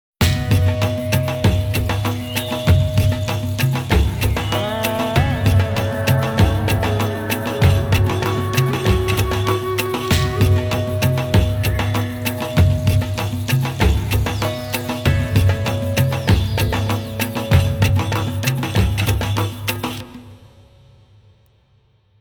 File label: Tribal clock alert; Nokia; Audio themes